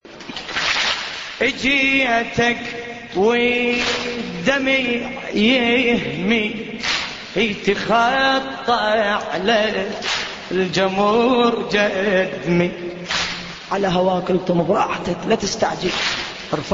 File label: islamic tone